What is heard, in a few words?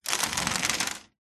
blinds venetian rub slats with hand; zapsplat